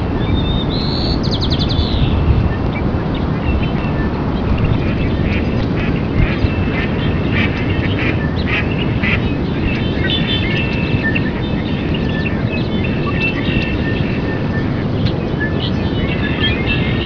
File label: duck